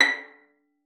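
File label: violin pizzicato B5; Xsample VOL-5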